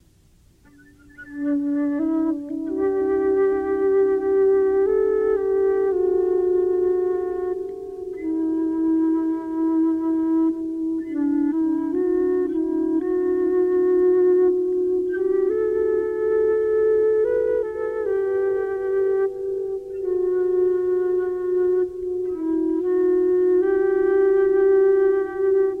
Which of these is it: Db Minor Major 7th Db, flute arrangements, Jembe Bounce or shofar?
flute arrangements